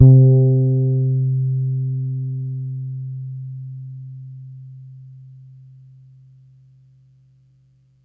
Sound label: Es C4 hard, Bass, Pedulla Thunderbass; Giga Samples Collection